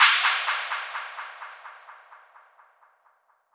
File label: Crash, Drums Cymbal